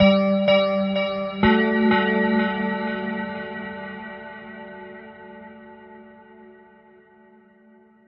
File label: 126 bell echoes a; SYNTH AND ELECTRONIC LOOPS